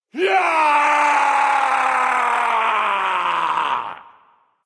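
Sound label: nonverbal battlecry; smite; voicepack; ullr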